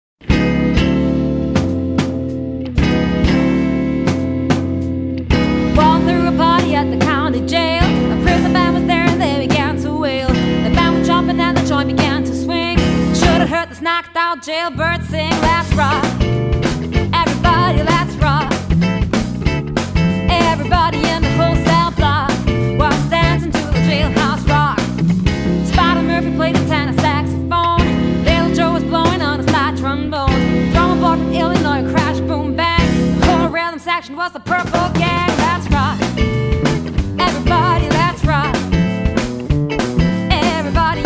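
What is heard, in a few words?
Quintet (voice, guitar, guitar, bass, drums)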